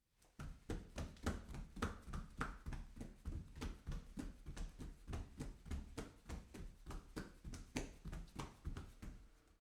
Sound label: walking on stone floor